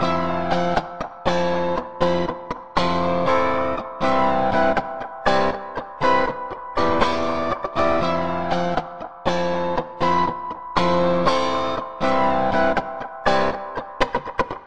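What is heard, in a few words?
phasing funk minor guitar verse, 120 bpm; SYNTH AND ELECTRONIC LOOPS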